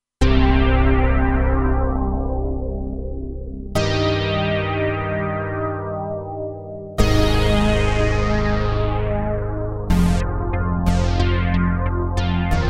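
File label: Reso Release, Roland Alpha Juno-2; Factory 2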